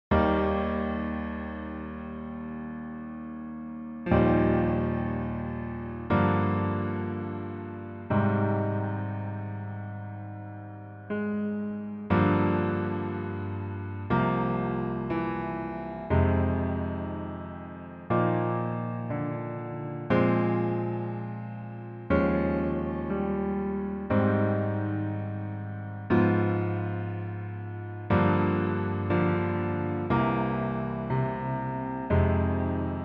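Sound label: Piano version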